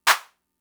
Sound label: Clap, Fricke MFB-522, Drum Machines